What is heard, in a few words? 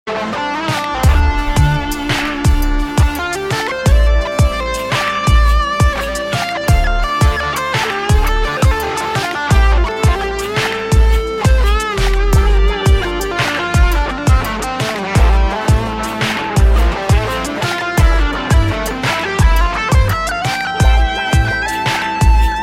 HEART GUITAR RINGTONE